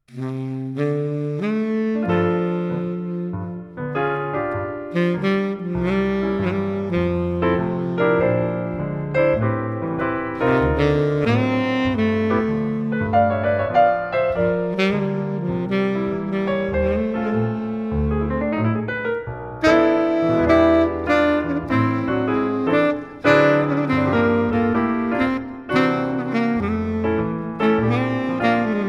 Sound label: Sax